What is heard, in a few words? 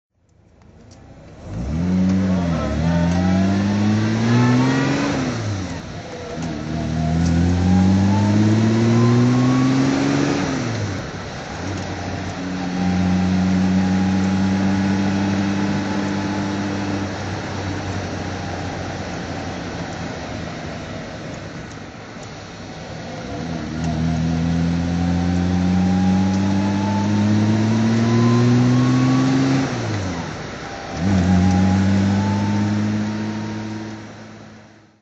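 jag xk120se dhc drive, Engine sound driving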